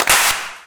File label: Clap Mix; Kicks and Claps; Vengeance Samples